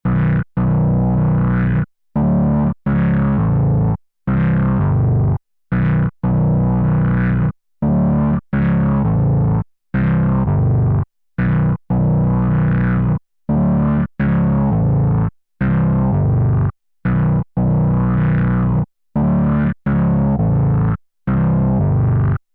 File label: Behringer K2 Ext In Bass Loop LP LFO HP Resonanz